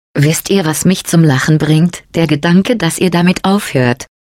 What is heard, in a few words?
laugh, de; GLaDOS; taunt